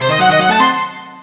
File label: SFX Pinch; Futari wa Precure Splash Star Panpaka Game de Zekkouchou